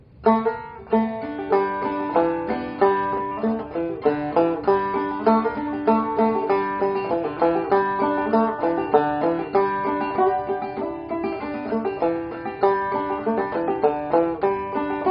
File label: Down the Road Banjo